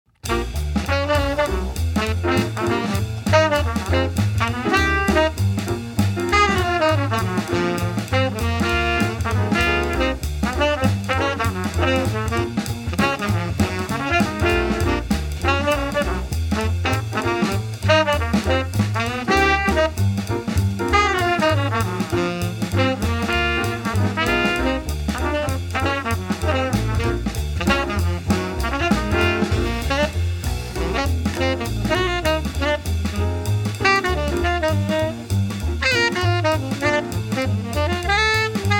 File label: Blues Etude